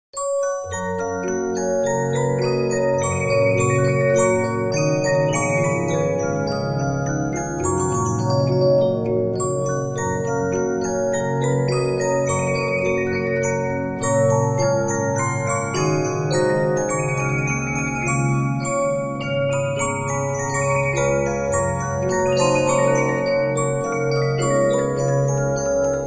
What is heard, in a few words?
Regina, 15inch disc musicbox